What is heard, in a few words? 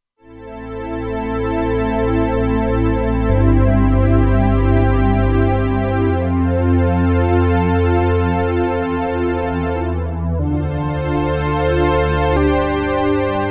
Warm Strng, Roland Alpha Juno-2; Factory 2